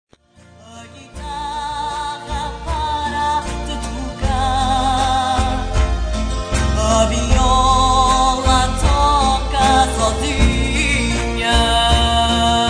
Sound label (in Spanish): guitarra mix